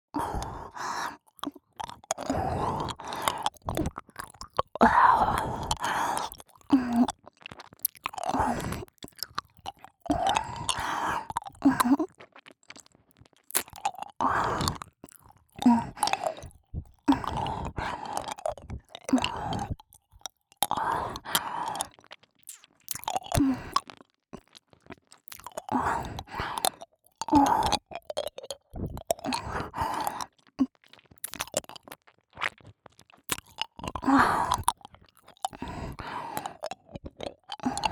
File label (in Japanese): ASMR キャンディ; えろぺろ